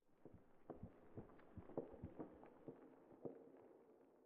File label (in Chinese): 苍白垂悬苔藓声